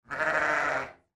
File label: sheep bah